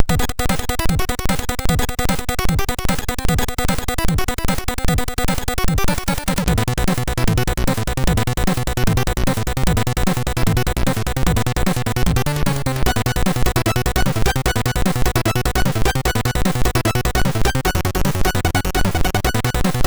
Rave, MOS6581R3

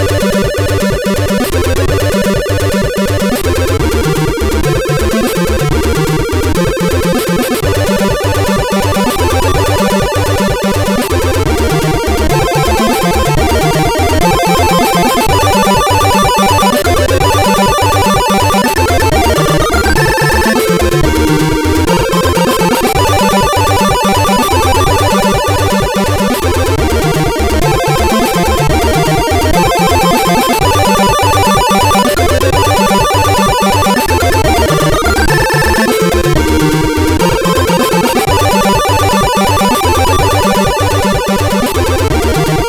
Remix, SID CSG8580R5

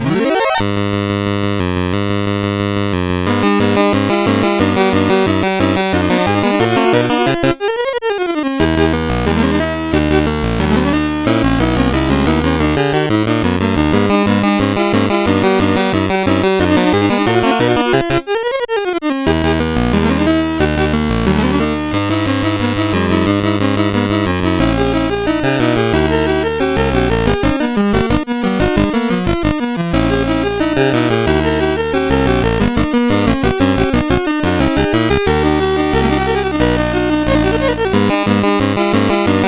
Battle Medley, sid CSG8580R5; Final Fantasy

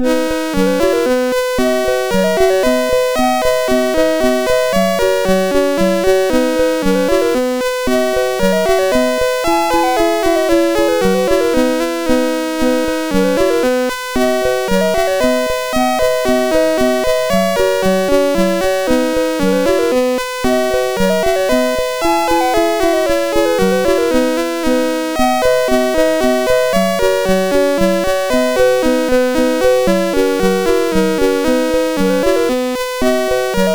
Polonaise, sid MOS6581R4; Music Shop

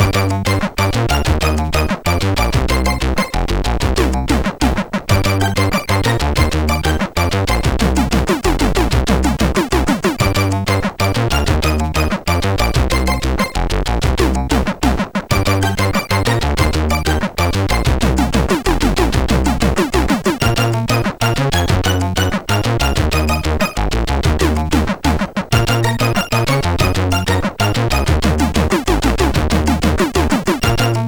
Intro sid MOS6581R4; hvsc

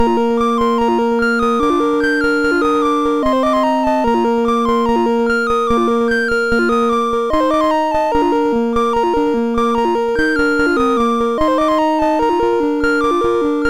Casio Keyboard Song, MOS6581R3

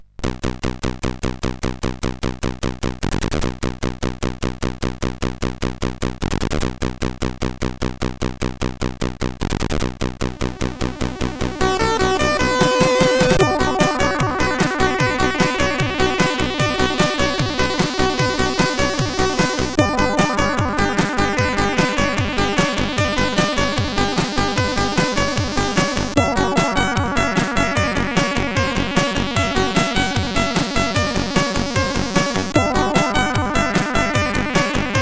Koto Cover, CSG8580R5

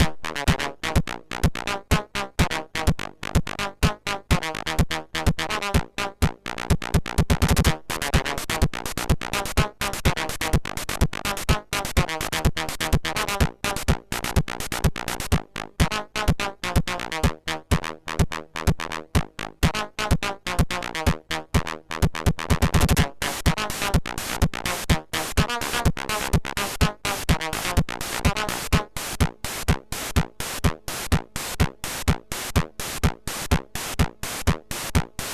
Techno Playtune, sid, 6581; MOS6581R3

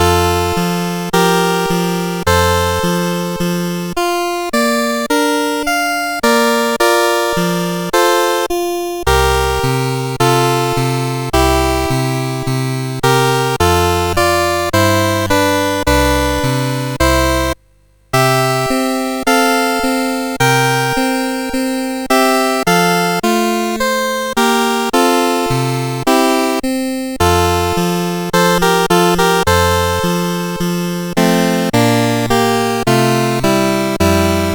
Serenade, CSG8580R5

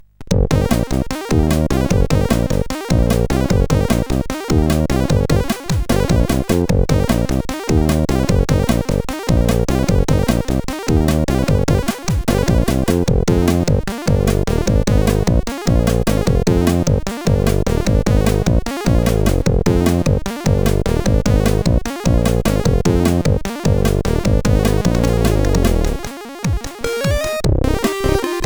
CSG8580R5

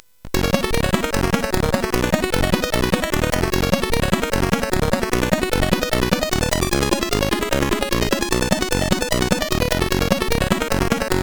Crowd, CSG8580R5

